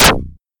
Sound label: sfx pistol fire; duck game